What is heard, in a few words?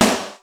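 OUCH snare drum; East West; Global Sounds; R8M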